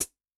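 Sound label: Lite Pedal, HiHat; Yamaha DTXpress-II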